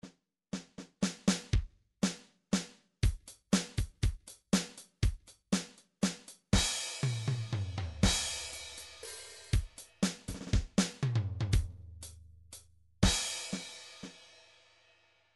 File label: midi drums, waltz